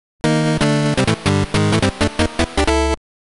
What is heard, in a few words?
Mission Start; G.I. Joe A Real American Hero; NES